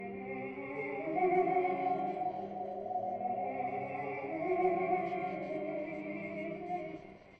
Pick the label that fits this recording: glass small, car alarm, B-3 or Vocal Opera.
Vocal Opera